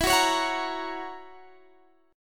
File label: Gb 7th E, chord